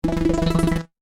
Computer Tone, Scifi, Computer, Tone, Musical, Synth, Science, Fiction; SoundEffects-BlastWave